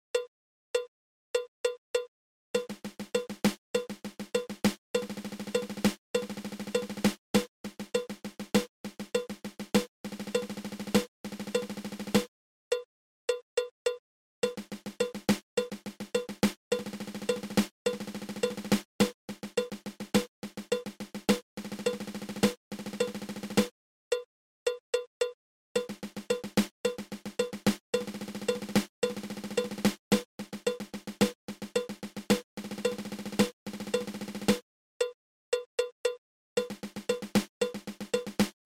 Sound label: Thirteen Stroke Roll